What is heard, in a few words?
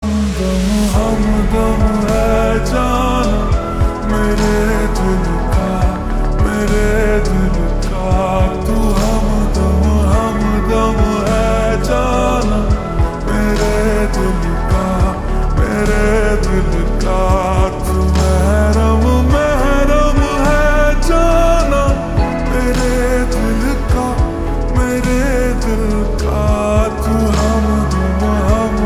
Ringtone Slowed Reverb